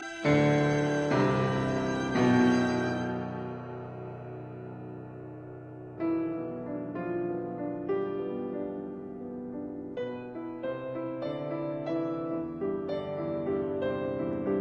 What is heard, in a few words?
Piano Trio Molto moderato; Harrison; Mirecourt Trio